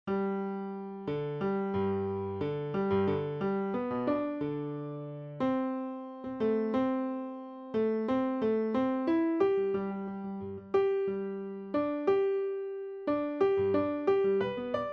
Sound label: Mozart Full piano